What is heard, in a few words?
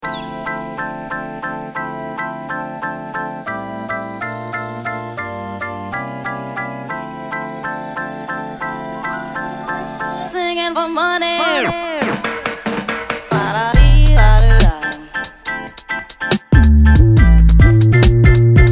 Gypsy Drill